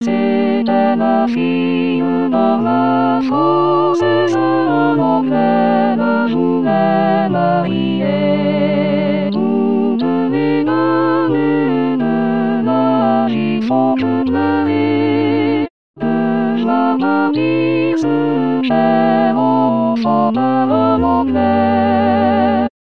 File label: tutti; fille roi francais